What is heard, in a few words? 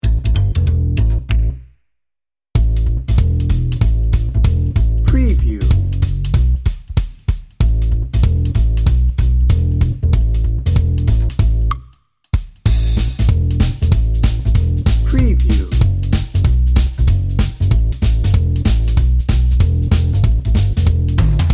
gypsy fiddle jazz dnb